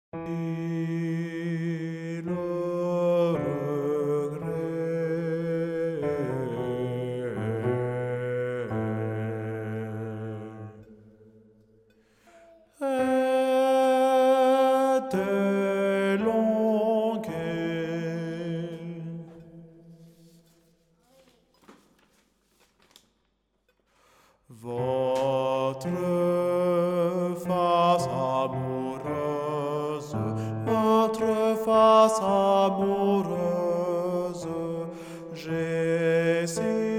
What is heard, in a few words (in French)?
MILLE REGRETS BASSE